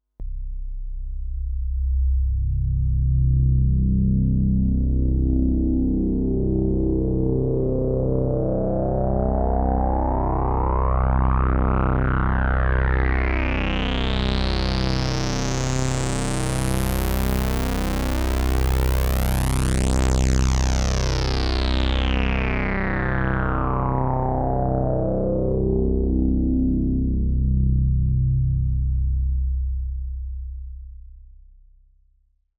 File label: Filter Sweep Reissue